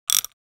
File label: Socket wrench turning single twist